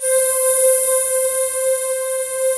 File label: breath C5, space; FAIRSPACE; Synth